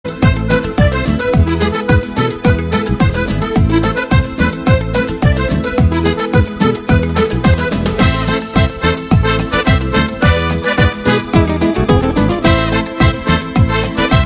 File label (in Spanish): cumbia gitana